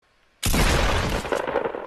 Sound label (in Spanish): disparo; fortnite